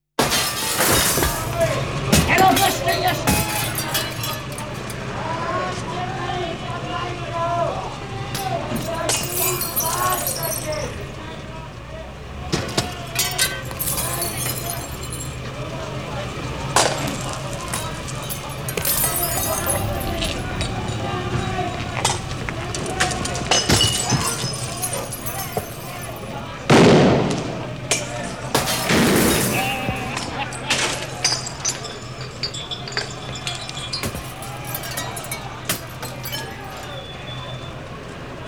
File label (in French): Émeute colère